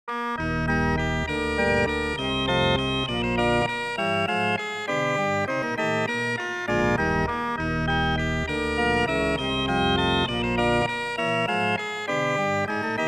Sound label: Organ Pipe 17wt